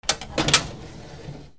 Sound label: OFFICE